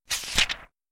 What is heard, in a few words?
flip